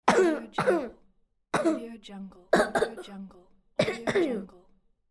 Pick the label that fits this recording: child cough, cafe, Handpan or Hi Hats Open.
child cough